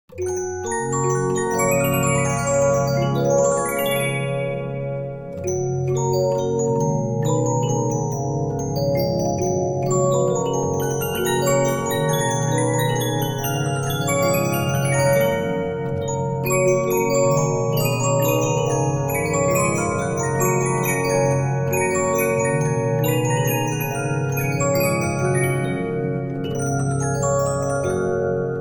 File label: Regina, 15inch disc musicbox